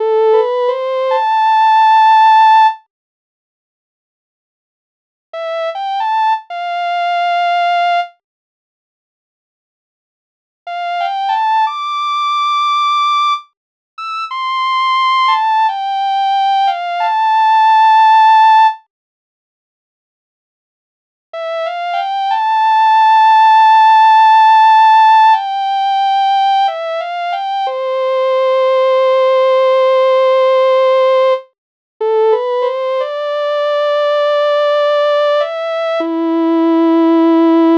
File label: Am90 Novachord Melody